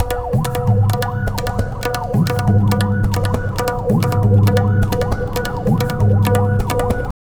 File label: Tabla, 135 BPM; Zero-G; Pure Tabla